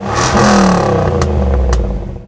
Z28 Rev; Automobiles

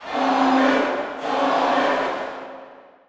Boxing Crowd Chant; SFX Kit; Crowds

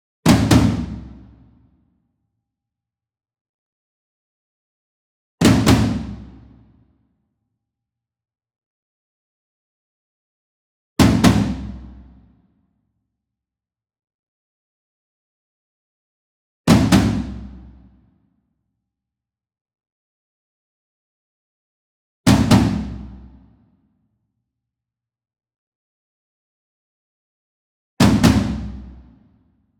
ENSEMBLE DRUM Concert Toms Double Medium; boom library; Cinematic Strikes Construction Kit

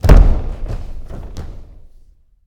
Boxing Ring Bodyfall

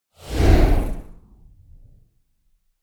Whoosh Fast And Deep Passing; Hollywood Edge; sound designer's toolkit v2

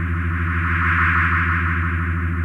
Sci-fi UFO Loop; SFX Kit; Sci-Fi Loops

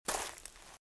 Footsteps Leaves Walk Right; RadwinIsland

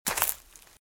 Footsteps - Leaves - Walk - Left; RadwinIsland

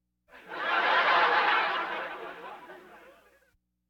Laugh tracks

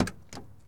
Truck Explorer Door Open; Trucks

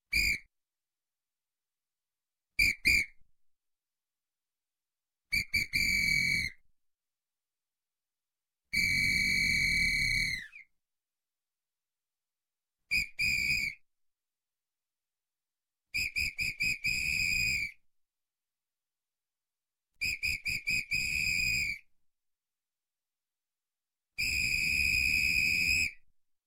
Whistle, Police police Whistle Short Blow; Sound Ideas 2000 Series

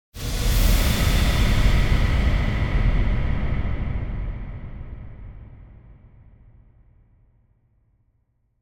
Air Explosion Deep Distant; Hollywood Edge; sound designer's toolkit v2

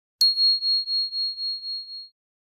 cartoon bell; NCH Library

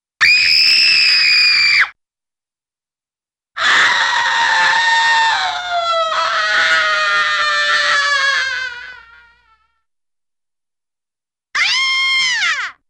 Girl-Woman Screams; Cartoon; Hollywood Edge; Cartoon Trax

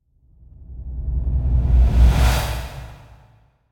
Impact Whoosh Air Breath - Deep Air; Hollywood Edge; sound designer's toolkit v2

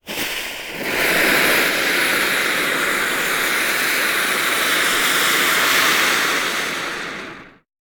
Fuse Flare; Sound Ideas; Guns

